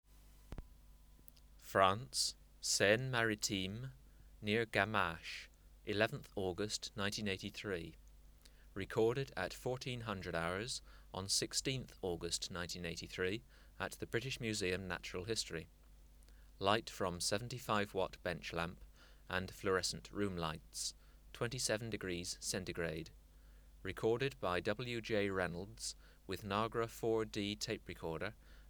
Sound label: Metrioptera bicolor; Natural History Museum Sound Archive